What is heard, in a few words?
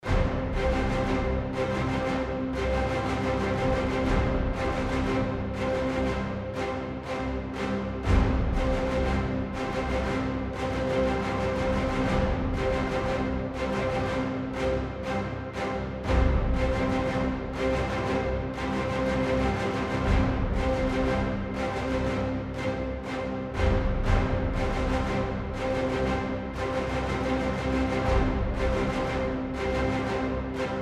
War Orchestra Loop